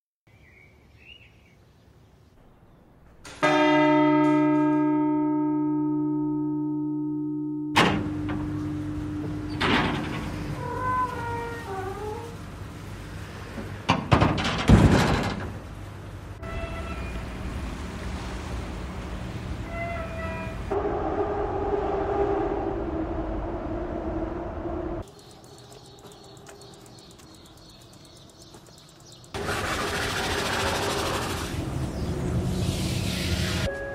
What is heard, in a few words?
orchestra; Lisboa